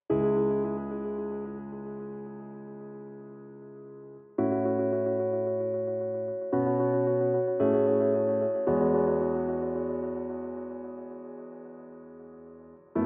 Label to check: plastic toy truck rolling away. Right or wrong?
Wrong